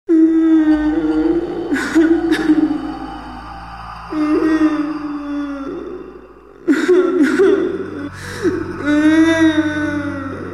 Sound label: scarry crying girl